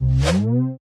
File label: gameshow tile appear